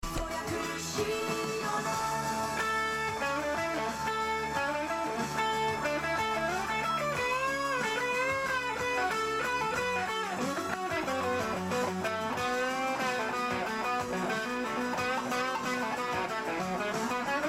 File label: solo, guitar